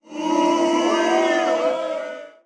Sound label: Boo; Legend of Keepers (gamerip) (2019); Pop Quiz Boo